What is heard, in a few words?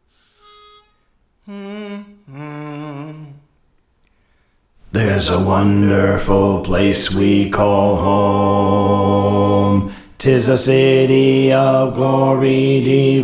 Bass; Praise For The Lord Training